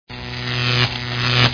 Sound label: neon light